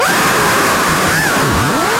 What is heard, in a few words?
guy screaming in terror; meme